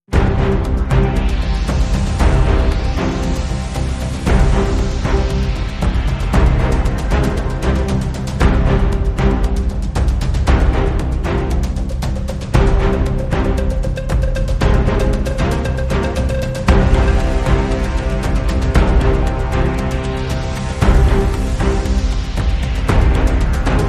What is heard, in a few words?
Pressured Tension, No Guitars